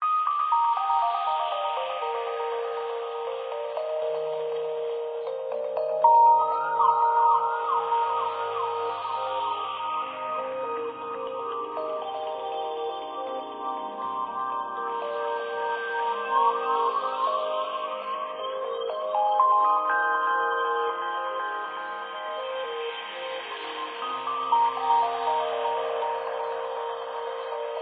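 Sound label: Music Ambient; Saints Row 2; PS3 Windows Xbox 360 gamerip 2008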